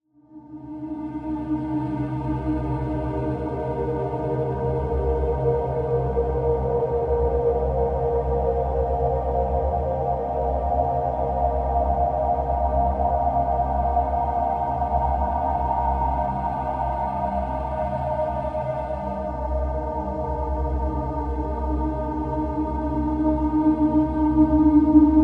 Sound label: Dark Atmosphere, Ambience